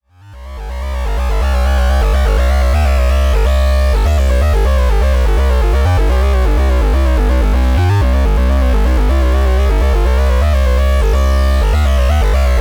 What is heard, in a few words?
Xaoc Devices Leibniz Binary Subsystem Jena Shape Modulation